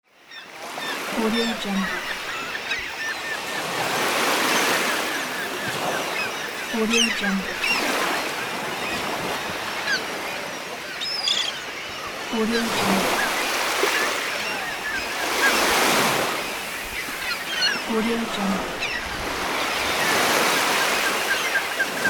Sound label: beach ambience; lama sound